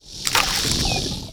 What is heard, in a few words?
VOLCANIC GRUMBLE WAR ATTACK